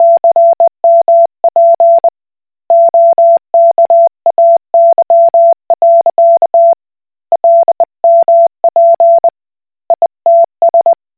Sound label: Koch Morse